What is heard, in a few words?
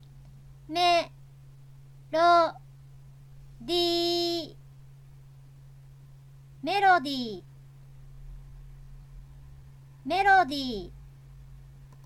melody